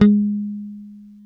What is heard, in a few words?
HIPH G#4, Bass, HIP-HOP; Spectrasonics; Bass Legends CD 1